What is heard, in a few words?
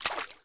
walk step water; skater; Tony Hawk's Project 8; XBOX